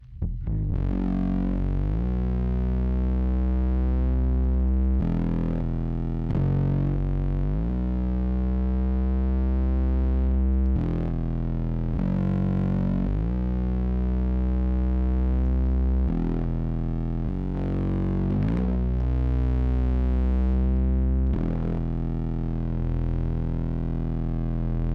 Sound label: bass boost, bass DI effect pedal; MXR